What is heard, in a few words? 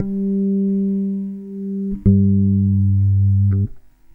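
DNCE BASSE, 115 bpm; USB Soundscan vol.32; AKAI; Roots Disco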